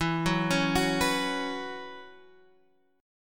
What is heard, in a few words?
Gb 7th Suspended 4th E